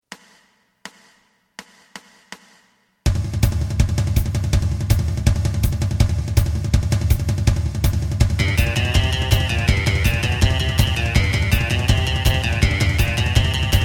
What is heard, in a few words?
bass